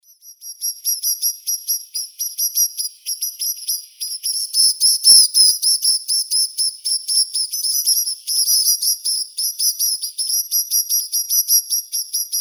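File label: snowfinch, Montifringilla nivalis, chick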